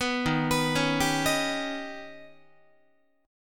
D sharp Augmented 7th D sharp, Guitar 4A,4E,5A,5D,5G sharp,6C sharp; arpeggiated; 14,11,14,11,11,14; JGuitar